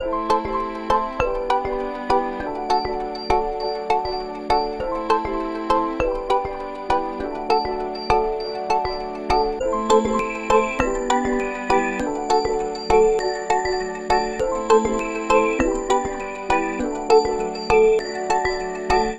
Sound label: Processed Loop